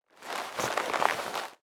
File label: MOVEMENT PEOPLE; SIMUZENSOUND